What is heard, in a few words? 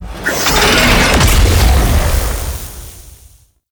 Vent Finisher Pull Vent Explosion; Warframe; Venus; Orb Heist